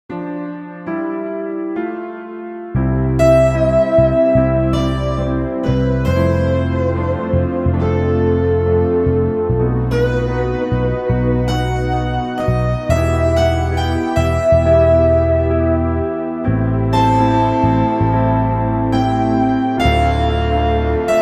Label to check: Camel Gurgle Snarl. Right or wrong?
Wrong